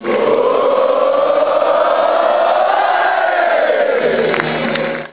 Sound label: Hooooooo hoooley, chants